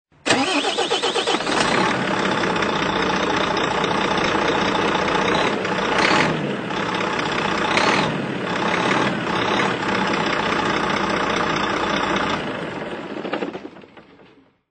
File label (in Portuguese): toque para celular volvo fh 440